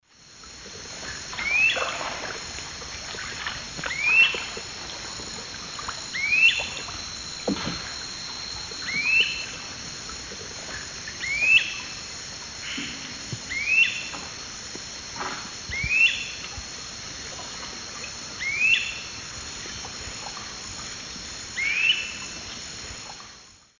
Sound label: Myiarchus crinitus, calls; S. America; Eastern Lowlands